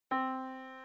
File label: yamaha Disk